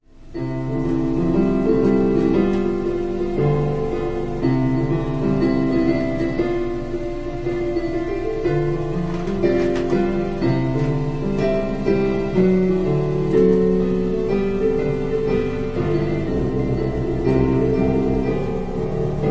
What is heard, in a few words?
Piano prelude